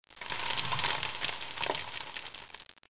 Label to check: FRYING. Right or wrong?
Right